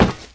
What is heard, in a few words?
warehouse step; Original TK Sounds